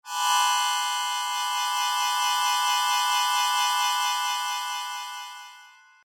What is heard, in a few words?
Sho